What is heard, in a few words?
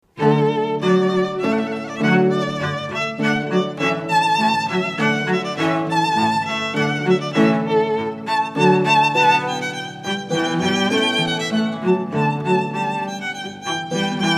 Hornpipe from Water Music